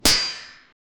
a sharp crack of a

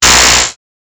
electricity

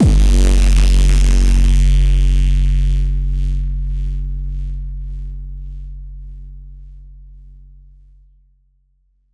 157bpm f 808, hip hop

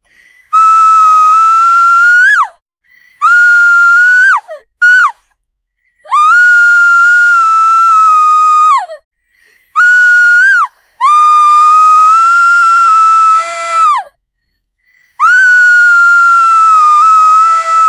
female pirate whistling; whistle

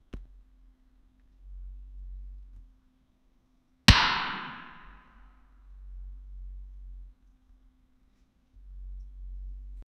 sharp smack of a volleyball

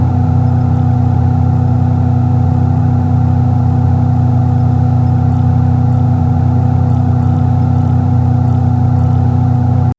loopable sound of vespa, scooter; sound effect

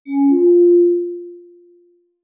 gentle sound, apology

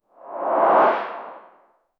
ui sound effect, time

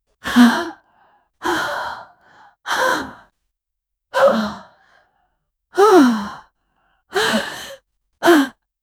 aggressive demonic female

